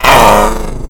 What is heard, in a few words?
pain; sleeper